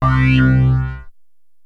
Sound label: Access Virus B; Synthworx